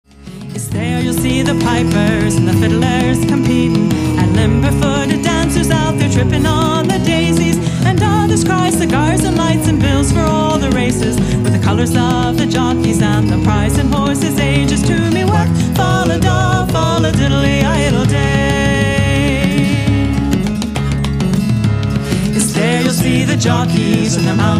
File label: The Galway Races, Irish Band